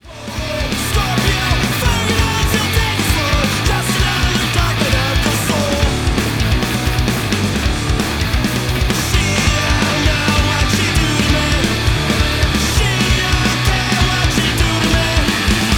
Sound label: DISTORTION